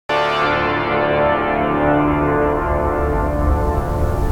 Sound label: unreverb, organ